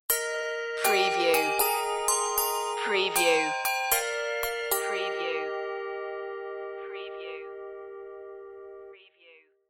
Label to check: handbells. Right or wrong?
Right